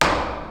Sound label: Kick; 音MAD; 转生成为了只有乙女游戏破灭Flag的邪恶大小姐